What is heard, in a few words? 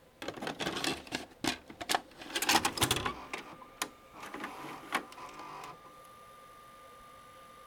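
VCR Cassette Insert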